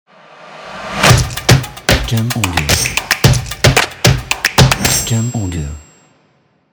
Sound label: Percussion Stomp Claps Ident